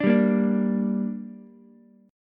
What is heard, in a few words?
G Minor G down Ukulele, chord; strummed; JGuitar